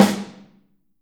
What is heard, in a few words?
SN, Drums Percussion